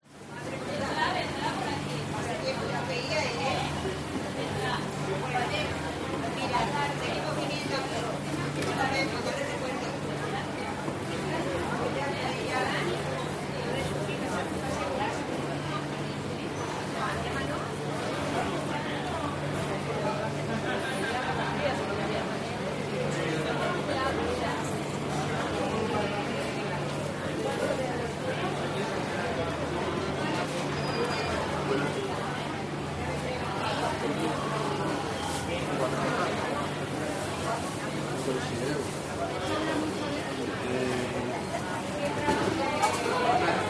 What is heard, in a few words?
SPANISH CROWD Market 40 50 People Adults; gain walkers